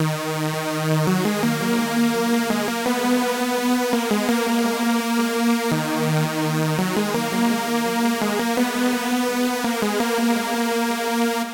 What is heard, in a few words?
saw crunk; d3m3nted